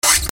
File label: DOORS